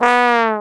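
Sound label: B 1 trombone; Best Service; Brass Super Section; 90 s Sample CDs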